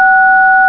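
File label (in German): Tonwahl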